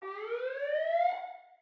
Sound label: Ambience Police Siren; Subway Surfers City Sound Effects; Android